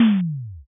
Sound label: impact text